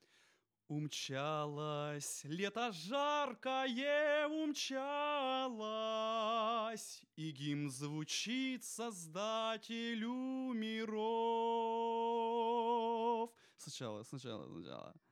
vocal

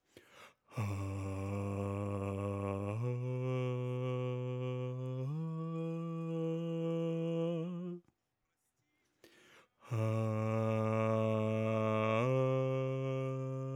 bass

